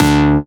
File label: Synth Cuts; Vengeance Samples; VES1 Instruments